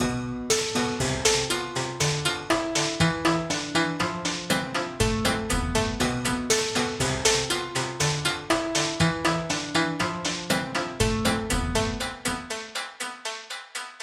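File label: Ping Pong, altered piano; feedback echo plugin; Preset: Ping Pong Queen; Newfangled Audio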